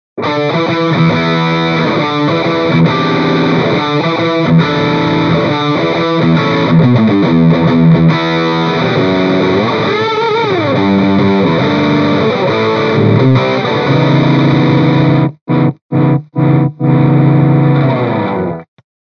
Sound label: Mini Rox Mic Inside PRIVATE JACK Distortion Les Paul